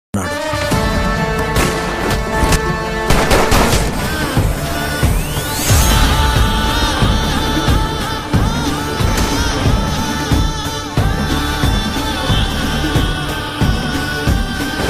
trailer bgm ringtone; tiger nageswara rao

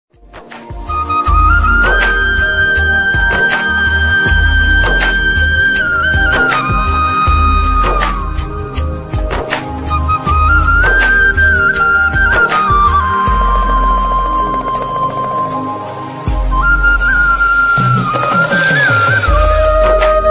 om namah shivaya flute ringtone; mahakal mahadev

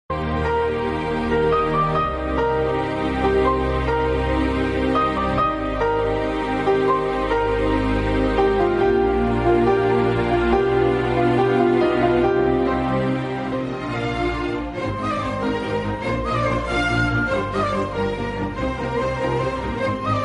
movie bgm, love bgm, ring tone; oye

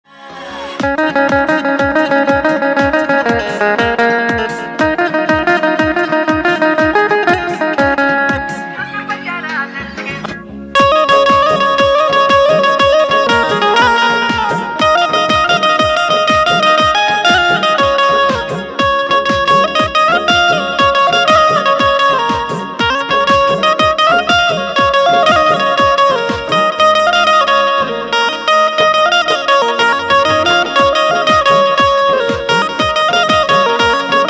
banjo ringtone music ringtone banjo

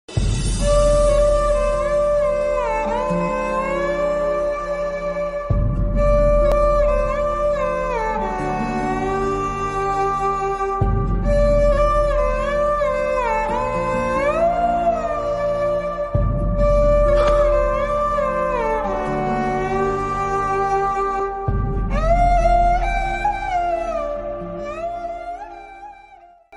love sad bgm; jaya janki nayaka movie

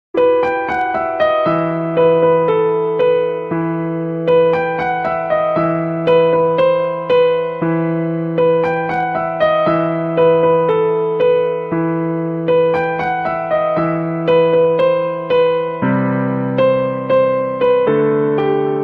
instrumental ringtone

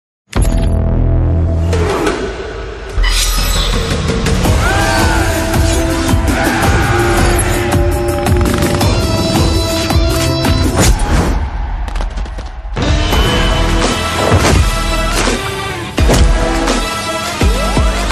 bgm ringtone telugu bgm; pakka commercial

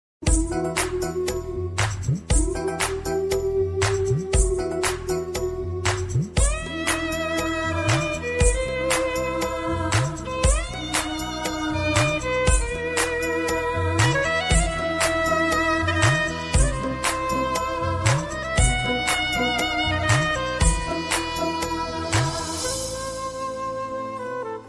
kannada bgm ringtone; upendra

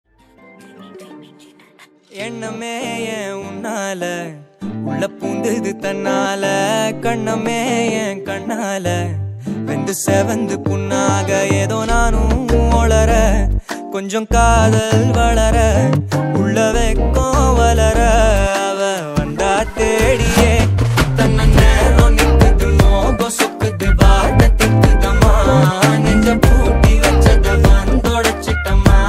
song bgm ringtone

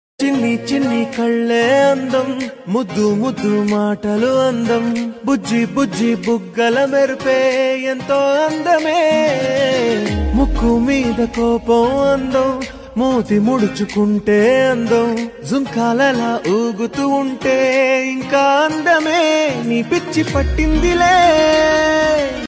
telugu love ringtone; mad

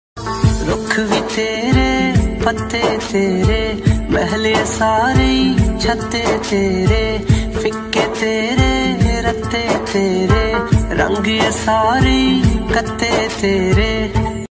gurbani ringtone waheguru ringtone; baba nanak; dharmik ringtone; diwali

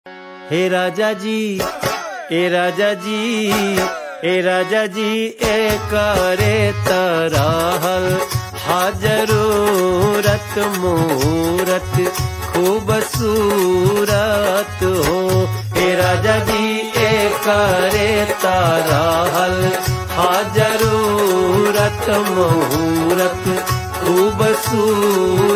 Bhojpuri; Panchayat

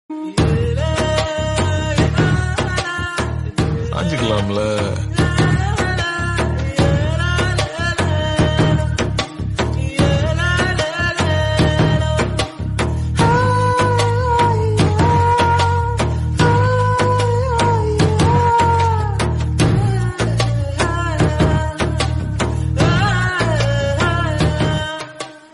romantic bgm ringtone tamil; paruthiveeran movie

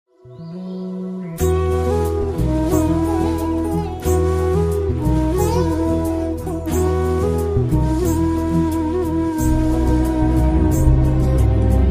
beautiful love flute bgm ringtone; anupama

